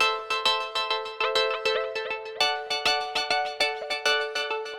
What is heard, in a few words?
synth Liquid Keys 100bpm G